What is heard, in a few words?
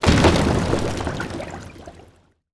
fishboat death; ships siege